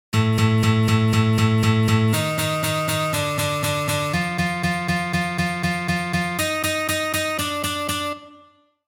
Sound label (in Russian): Uprazhnenie dlya igry oktavami